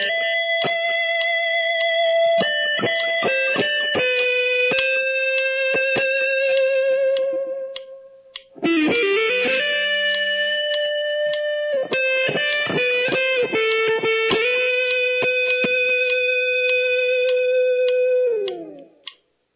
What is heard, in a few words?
lick, guitar